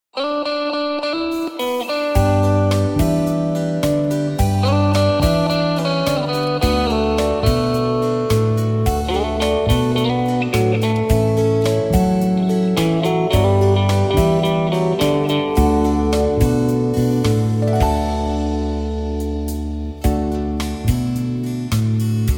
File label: (D) No Backing Vocals